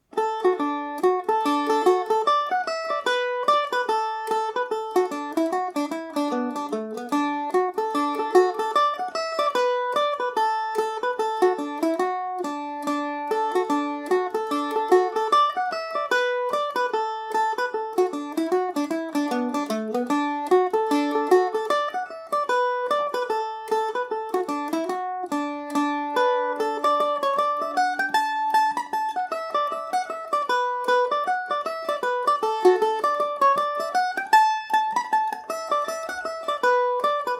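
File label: D major falder, irish mandolin; The Home Ruler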